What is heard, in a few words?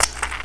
9mm clip; svencoop; biglolly coop